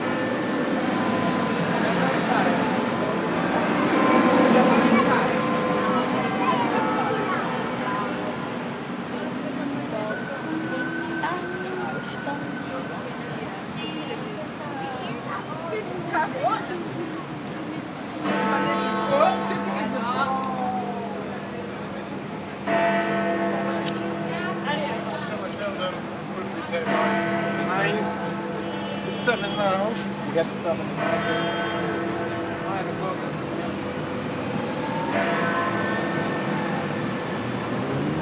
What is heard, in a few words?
7 o clock at westminster